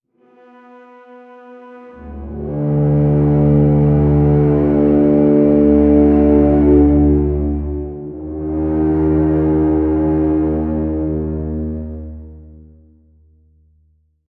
dramatic, fear

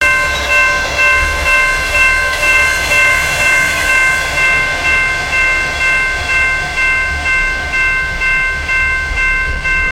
realistic warning sound o, locomotive

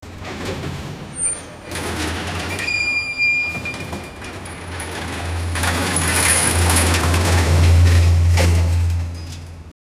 the sound of old rusty, machinery

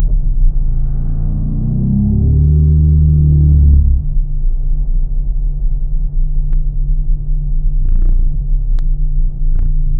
flying car engine sound

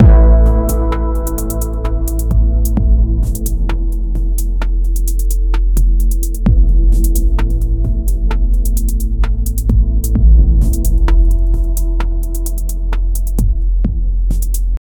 intro mid slow beat sound

